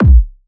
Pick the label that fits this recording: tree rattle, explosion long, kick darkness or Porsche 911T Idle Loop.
kick darkness